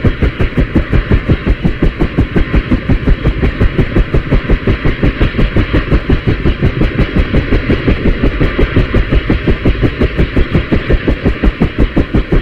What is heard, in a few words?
rotor CH47, aircraft engines; DCS